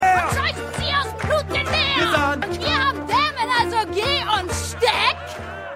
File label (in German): Gesang; House of the Dragon; Lady Alysanne Schwarzhain